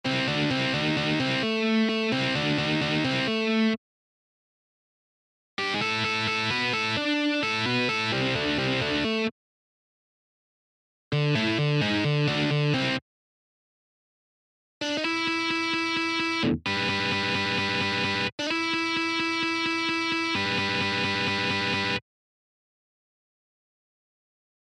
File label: double stops